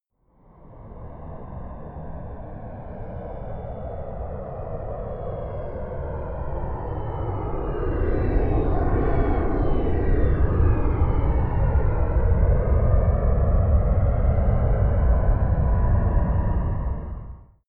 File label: plane boeing 787 flyby left to right distant; flugzeuge verkehrsflugzeuge; verkehr transport